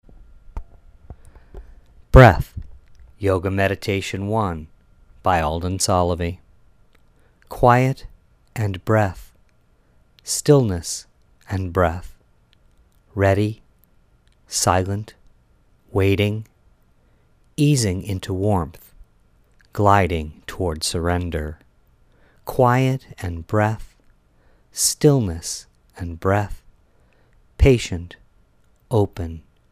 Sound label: breath